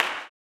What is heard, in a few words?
CLAP, Blues Soul; Spectrasonics Vocal Planet